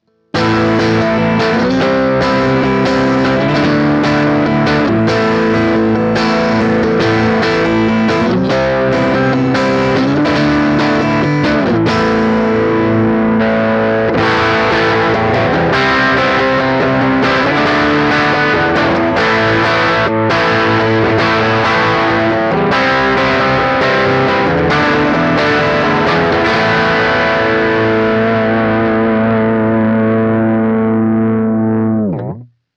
Vox MV50 Direct Breakup

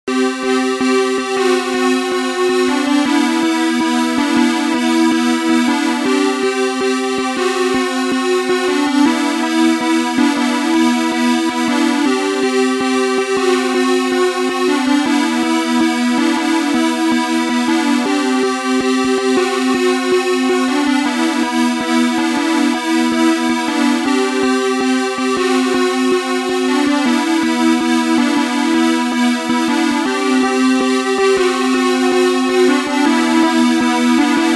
Brassy Pad Bass Dry To Wet

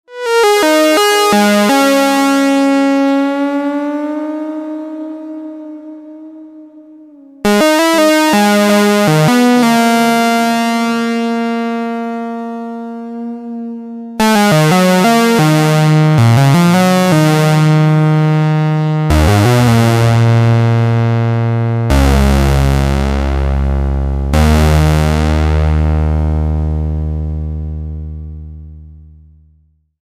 pro1 syncro mod

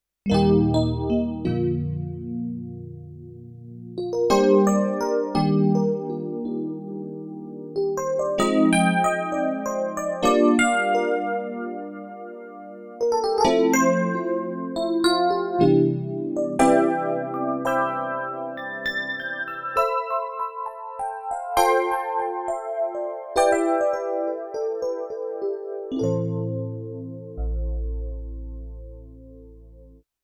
E Piano

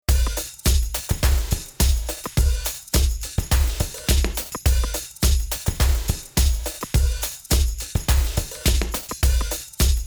Beat

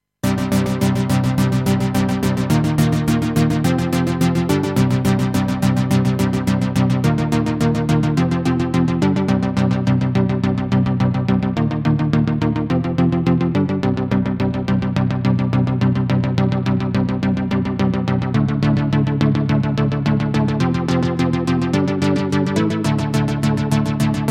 BassSeq Filtered

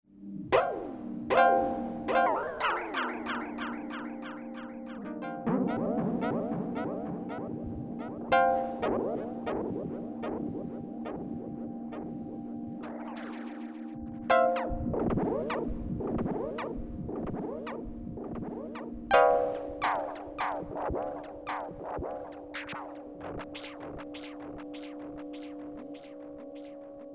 Juno MX200 Delay

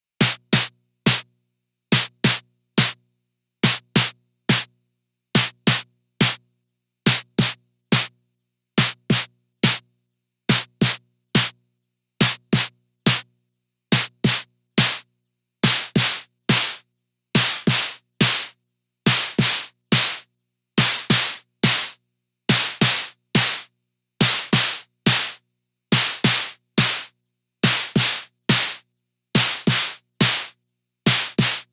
Snare

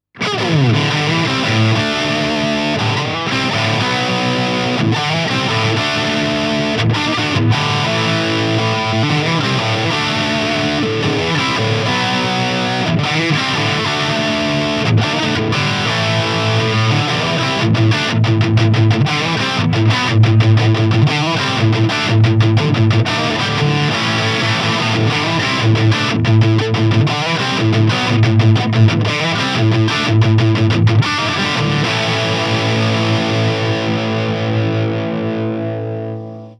Ibanez FRIX6FDQM BMG Iron Label Lead

